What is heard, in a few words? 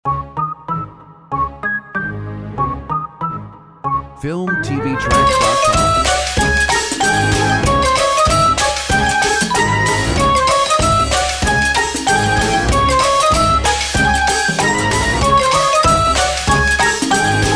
Animated Cartoon; Filmtv-tracks